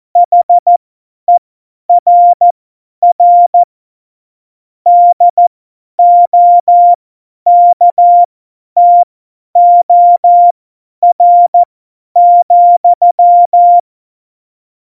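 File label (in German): Witz, Tempo 50, Morsekurs, cw morsen; Witze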